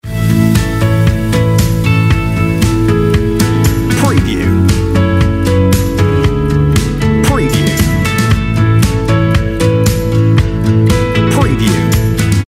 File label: On Hold Music